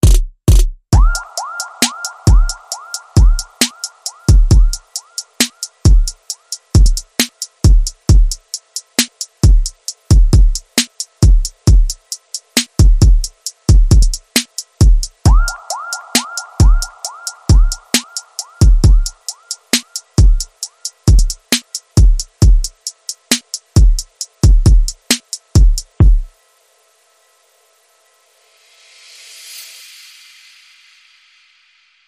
Neglected Soul Beat

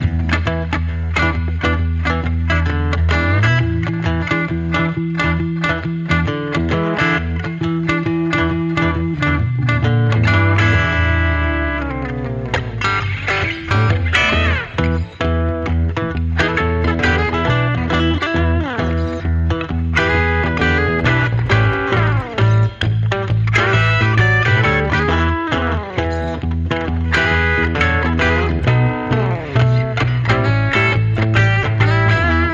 Electric Redneck, No Drums

